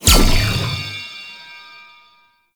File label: wave ability cast; Enemies; Abilities; Warframe; Duviri; Dax Knight